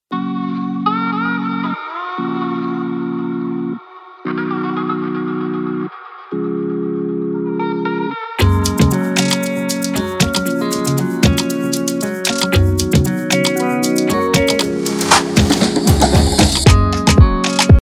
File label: 116 BPM Afro pop intro, Type Beat Instrumental